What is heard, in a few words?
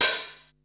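hi-hat open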